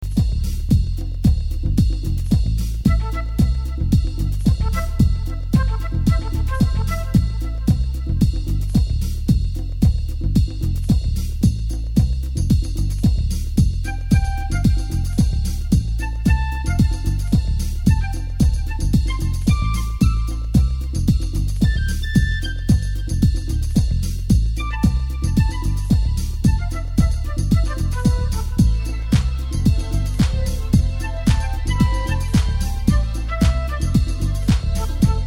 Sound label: Hypnotic Flute